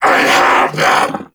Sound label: Vocal Attack; Warframe; Duviri; Dax